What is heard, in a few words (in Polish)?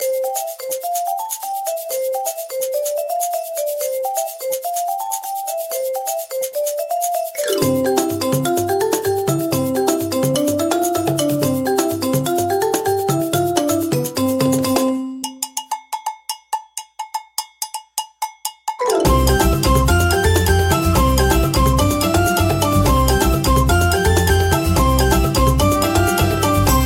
afrykanskie rytmy utwor instrumentalny